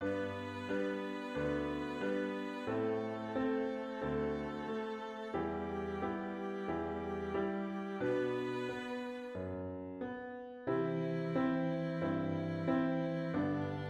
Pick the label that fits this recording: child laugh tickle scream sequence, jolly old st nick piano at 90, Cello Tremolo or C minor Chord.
jolly old st nick piano at 90